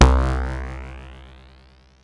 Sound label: BASS